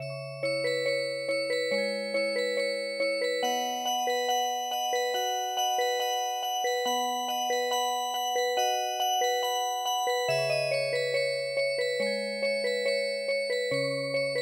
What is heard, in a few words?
Music Box; camel bell; イラン民謡の「ラクダの鈴」に癒し系のアレンジを施したオルゴール曲を聞くことができます。; 「ラクダの鈴」は、スローテンポな4拍子のその他アジアの伝統曲です。; ＰＣ ＭＵＳＩＣＢＯＸ; 癒し音楽（ヒーリングミュージック）